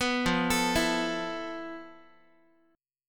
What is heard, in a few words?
F Major 7th Flat 5th Guitar; arpeggiated